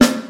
dark sounding snare drum sound b key, snare tuned to the B note